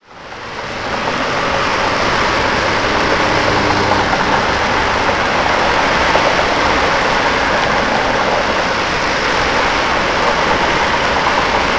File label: Cars